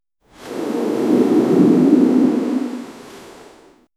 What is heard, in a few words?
a gentle breeze blowing